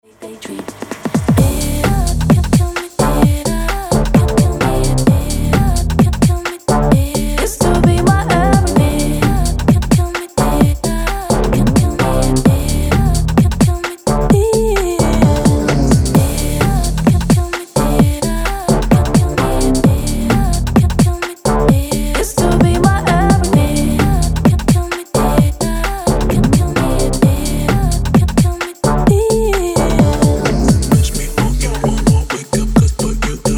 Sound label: ELECTRONIC